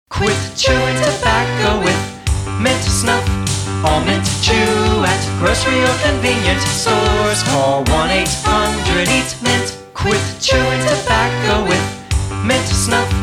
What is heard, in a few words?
jingle